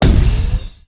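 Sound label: ricochet; StarSiege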